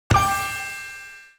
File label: victory medal; Replay VHS is not dead